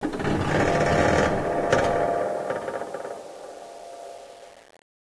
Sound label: distant creak; dm cavernous